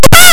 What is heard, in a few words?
death; galactic protector